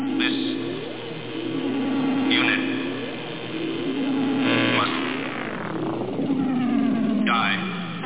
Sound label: Exit Windows; StarTrek